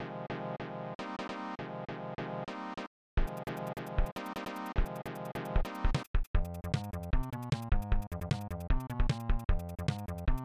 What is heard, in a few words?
Level Music; Space Football; SNES